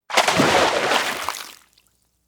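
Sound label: body falls into water; Parkour; Butterfinger Video Sound Effects; Additional Parkour Sounds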